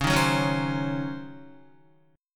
Db Major 7th Suspended 2nd Suspended 4th, Db, Guitar; strummed; JGuitar